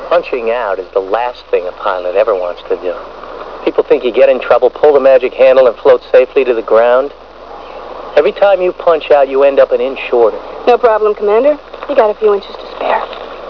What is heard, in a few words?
Punching Out